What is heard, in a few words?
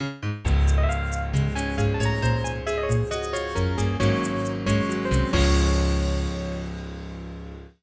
jingle